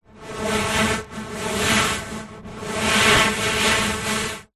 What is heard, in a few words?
human blow air over end card tube long blow change in strength; zapsplat